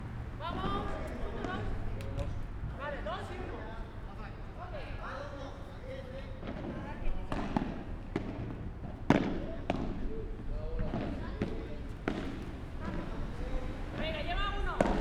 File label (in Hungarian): teniszoktatás gyerekeknek